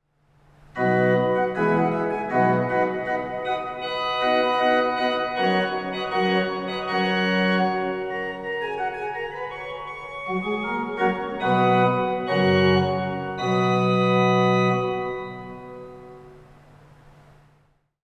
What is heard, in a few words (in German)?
Orgel, pfeifenorgeln; RP-Gedackt 8'+Prinzipal 4'+Flagolet 2'+Sifflöte 1 1/3'